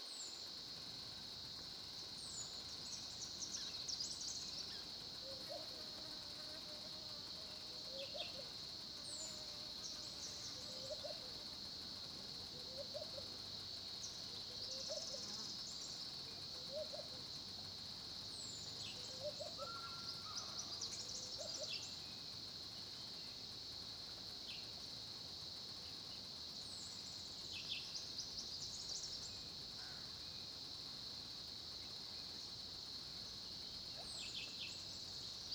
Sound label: South Africa Kruger Olifants small pond, SOUTH AFRICAN CRICKETS; Tonschliff